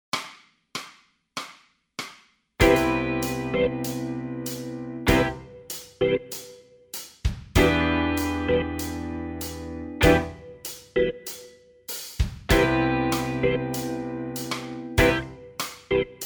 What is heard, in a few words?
bass blues funk, backing tracks